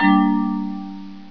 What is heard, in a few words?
BLING